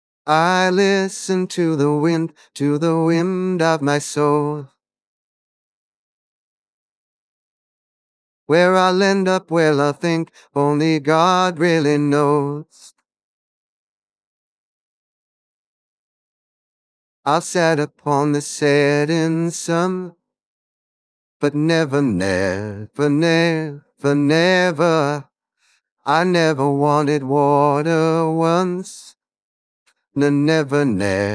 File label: Vocal Output; rvc v2